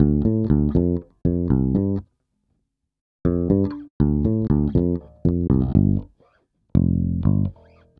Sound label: Fender Bass Dm, Bass Loops; PowerFX - Nu Jazz House